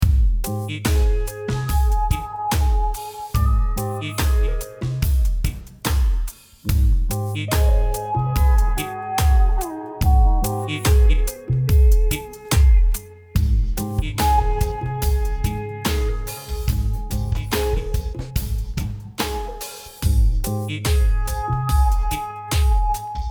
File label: slo funk cue